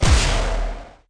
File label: jump thruster; startopia